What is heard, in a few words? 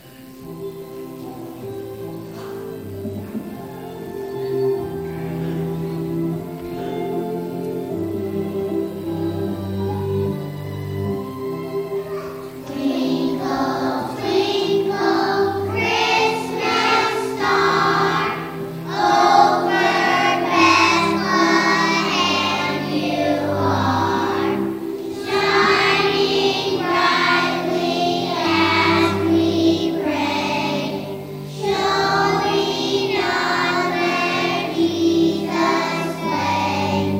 childrens choir